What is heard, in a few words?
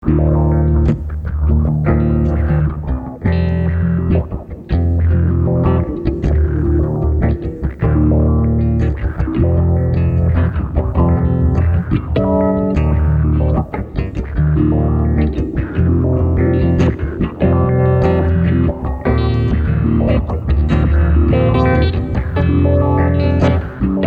Guitar